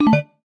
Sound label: UI Menu Connect Fail